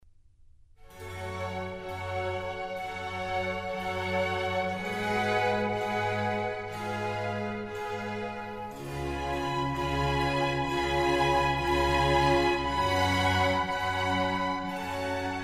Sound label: Concerto for Strings in A major; RV159; Antonio Vivaldi